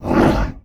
Monster Big Animal type Attack; Inotia 3

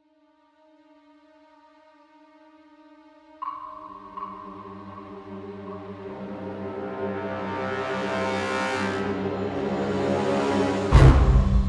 Cresendo Hit; rambo the video game 2014

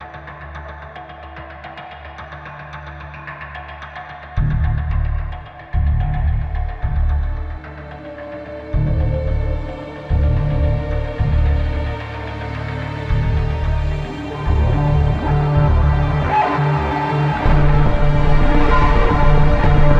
Suspense Oneshot

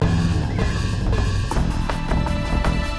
Action START

